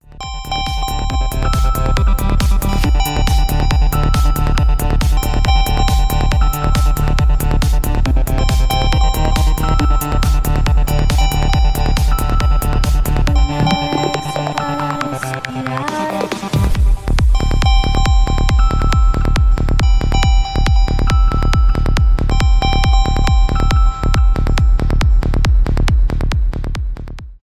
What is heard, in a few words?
lg velvet 5g uw ringtone